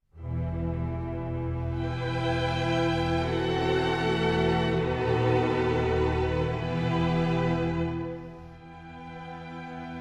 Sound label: Sinfonia; BWV 4